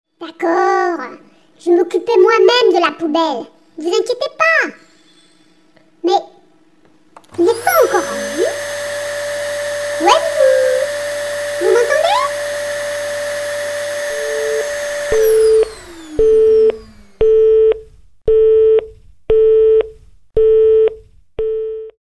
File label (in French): aspirateur